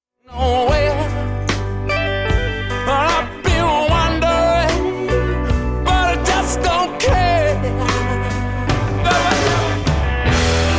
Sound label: laughin early died